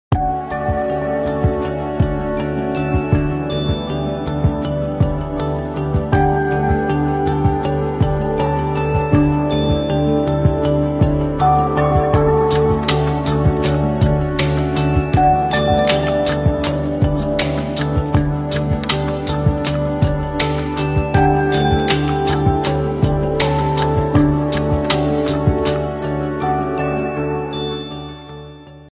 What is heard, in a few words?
nokia unia ringtone